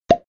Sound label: Cork pop; abcs; letter m